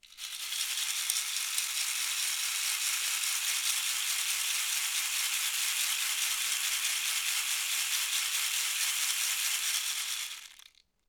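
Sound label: caxixi, African shaker FX; Roland LCDP14 Africa VOL-2; Perc FX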